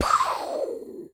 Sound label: gun rifle